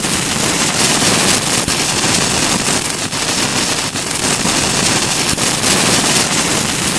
flare burn

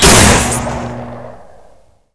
gauss fire

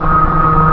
robo run; robolaser

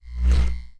energy, magic

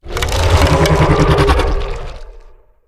breath; gonarch

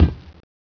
metal

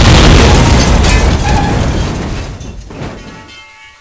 vehicle explo

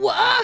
pain; trunks kid

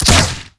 Single no reload, weapons, crossbow

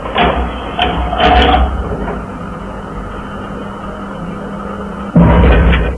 hiss start move stop

